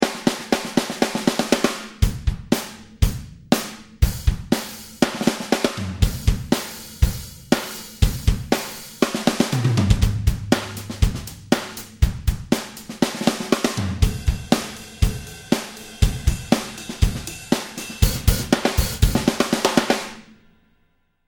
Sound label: pop rock beat